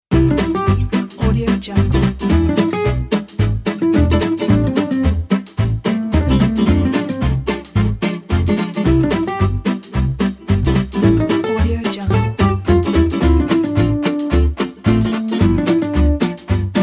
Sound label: happy